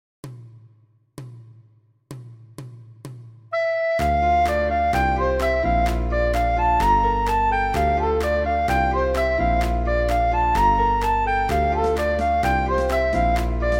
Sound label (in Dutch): alt supersnel